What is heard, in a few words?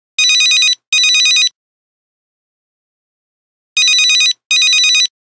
Ring ring, Nokia Buzzer; Windows Phone apps